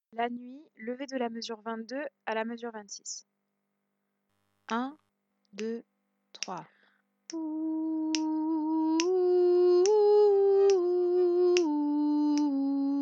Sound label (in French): alto; la nuit; jp rameau